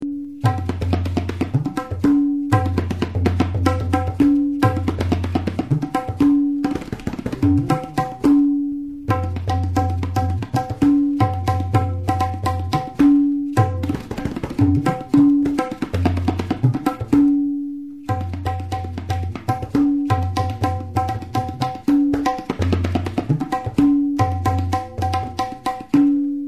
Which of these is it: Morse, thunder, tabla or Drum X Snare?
tabla